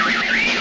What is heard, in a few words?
spinning